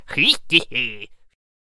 Ball and Chain Attack Vocals

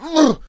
Hoplite Attack Vocals

